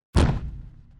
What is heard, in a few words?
pearls stomps